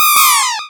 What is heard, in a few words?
Cry